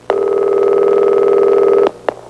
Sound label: single phone ring; Household